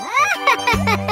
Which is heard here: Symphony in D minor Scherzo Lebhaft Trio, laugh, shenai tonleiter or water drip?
laugh